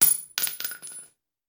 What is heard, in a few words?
Bullet Shell; Sound Ideas; Guns